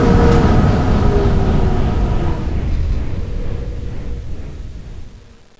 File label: fan off; XDM; fans